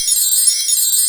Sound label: WIND BELL